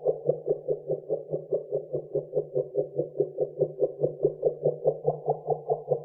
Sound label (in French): Jauge Loop Long; Puddle